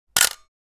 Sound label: Camera shutter click taking picture